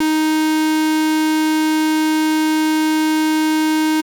square, 808, SubOsc

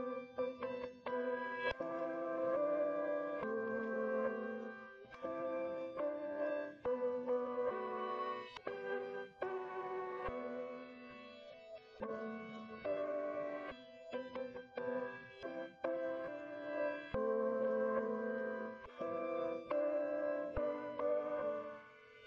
140 BPM E Min Delayed Send; Cymatics; Destiny Gold Expansion; Chardonnay

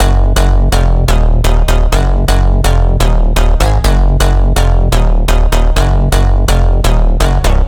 Big EDM Bass Loop F#1; Future House; Future House Tools; Bass Loops

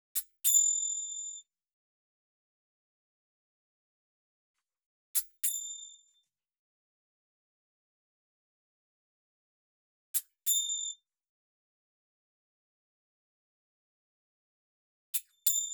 manjira 096; 096bpm; Hip Hop India Modern Percussion

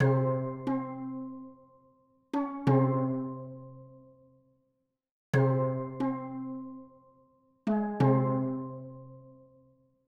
Synth, 090 Cmin

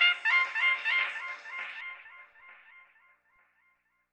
Stab; Thirty-One Flavors

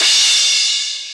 Crash, Hip Hop

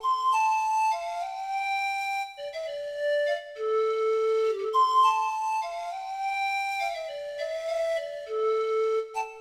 Pan Flute, Fmin

